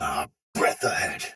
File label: block soldier sword agent male; Dialog; Barks; Battle; Melee; Sinecure